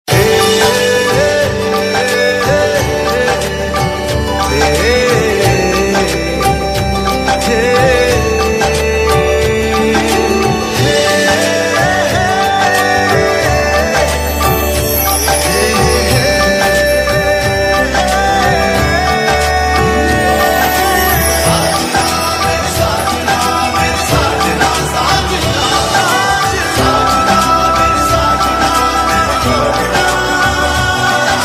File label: BG Tune; Anushka Rajat; TV Serial Tone; Colors TV; Shastri Sisters